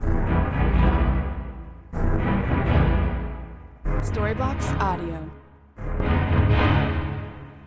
Ambient House Detuned Pad 125BPM A

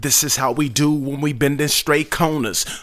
Rap Vox

